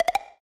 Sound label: Attention Free Spin Snd; netent; flowers